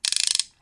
gacha dial; pokerogue

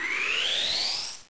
charge

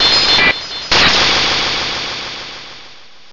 Techno Blast; pokerogue; battle anims

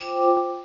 Reversal; pokerogue; battle anims

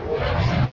Vacuum Wave; battle anims; pokerogue

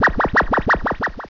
Tickle; pokerogue; battle anims

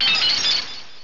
Mirror Shot; pokerogue; battle anims

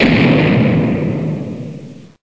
Explosion; pokerogue; battle anims

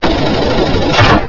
Drill Peck; pokerogue; battle anims

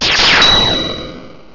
Zing Zap; pokerogue; battle anims

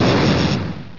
Fusion Bolt; pokerogue; battle anims

